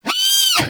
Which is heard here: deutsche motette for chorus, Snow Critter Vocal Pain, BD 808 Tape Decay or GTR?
Snow Critter Vocal Pain